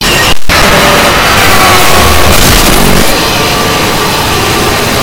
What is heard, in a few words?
entities screaming at the same time; all doors